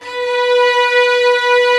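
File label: Violin Legato B3; Digital Sound Factory Virtuoso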